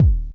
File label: kicks, Bass Drum